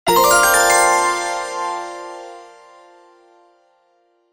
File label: logo beauty